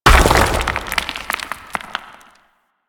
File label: Large Rock Crumble E; Ambience; Warframe; Grineer